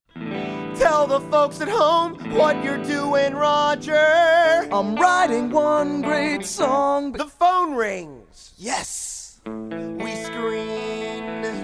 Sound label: Tune Up